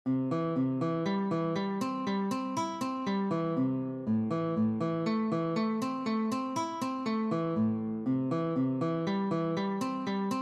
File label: fingerstyle